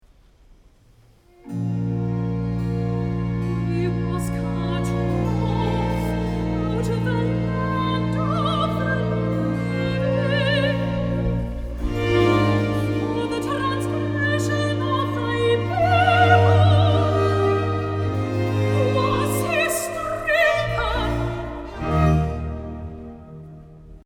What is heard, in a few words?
soprano